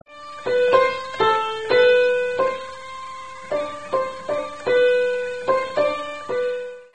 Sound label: piano; SD SOUND